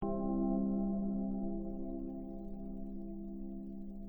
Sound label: harp test